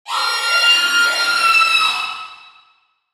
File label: wendigo angry, utterance